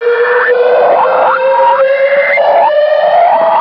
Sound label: Ancient Noise FX, Industrial